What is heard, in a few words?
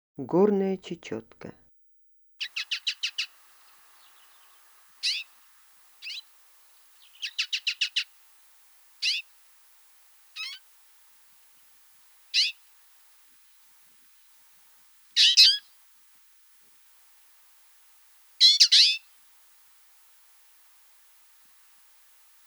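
twite voice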